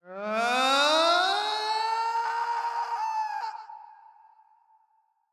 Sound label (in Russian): Падение; Фраза; Лусио